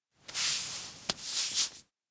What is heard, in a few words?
touch arm repeat; anemoi